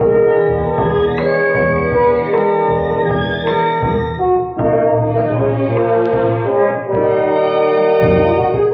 horns, Loops

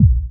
Kicks

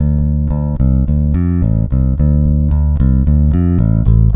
bass, Loops

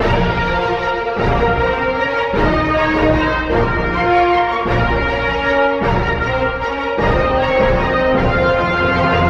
strings, Sad Loops

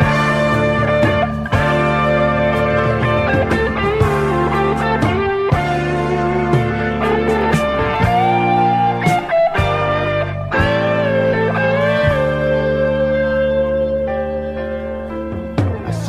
guitar

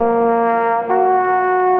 horns, Loops

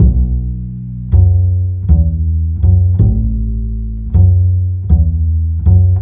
bass, Loops

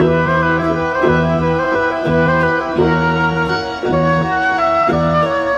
flute, Jazzmatazz loops

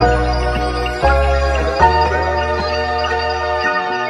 keys, phonky loops

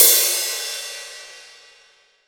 Cymbals

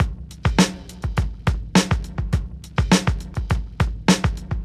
stripped bare, Boombap Drum loops

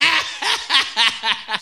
laugh

